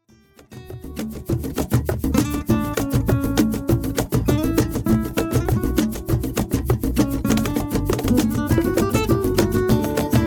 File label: instrument, flamenco